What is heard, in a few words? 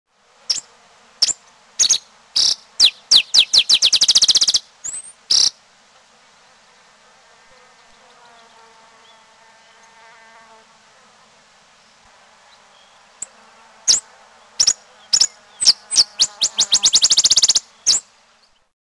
botteris sparrow